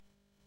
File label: jump; freepixel; Character Voices